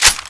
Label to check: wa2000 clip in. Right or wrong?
Right